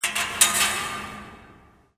metal stress; amb elements; cod modern warfare 2

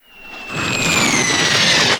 mortar incoming; modern warfare 2; Explosions

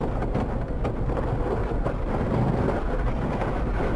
big door; modern warfare 2; super-mario

